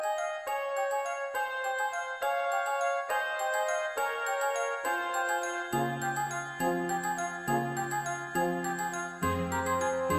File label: Bells